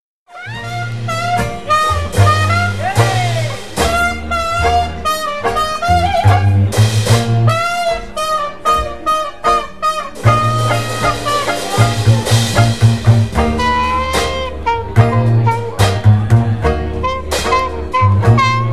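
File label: Jazz Band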